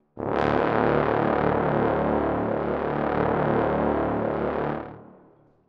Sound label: Dramatic Brass